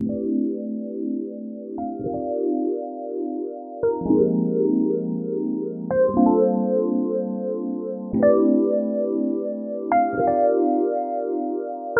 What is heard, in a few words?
jazzy